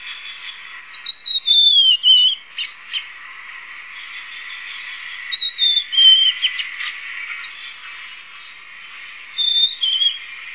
belogolovaya vorobinaya ovsyanka zonotrichia leucophrys

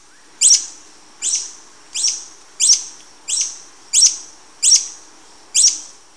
dlinnohvostaya kolibri phaethornis superciliosus

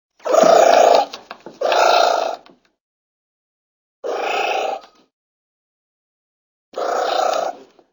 golos muraveda

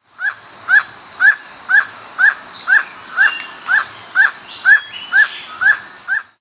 izumrudnij tukan aulacorhynchus prasinus

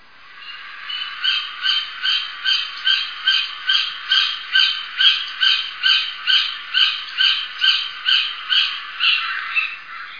bolshoj yastreb ili teterevyatnik accipiter gentilis

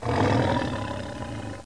tigr panthera tigris

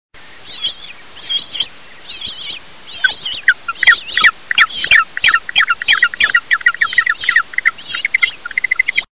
velikolepnij pingvin megadyptes antipodes

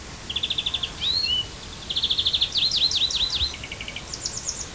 obiknovennaya zelenushka carduelis chloris

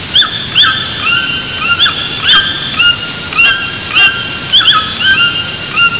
tukan ramphastidae

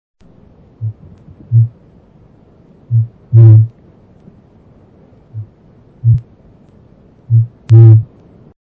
kakapo ili sovinij ara strigops habroptilus

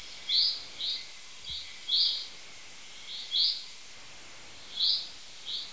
zyablik fringilla coelebs

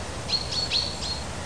zyablik fringilla coelebs

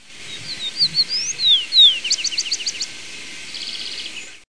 ovsyanka vyurkovaya izmenchivaya sporophila americana

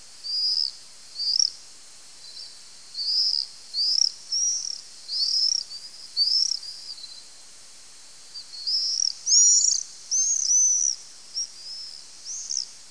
strizh apus apus

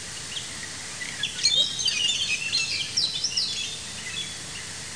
zelenushka chloris chloris

